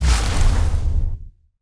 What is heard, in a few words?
card shining